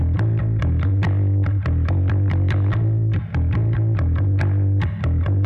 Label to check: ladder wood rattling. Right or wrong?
Wrong